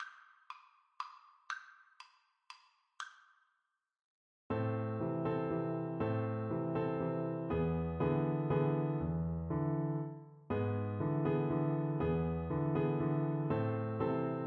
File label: la partida FL kar, Flute